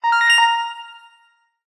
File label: mailbox chime; irc